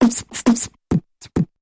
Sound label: Big Mouth Percussion Variation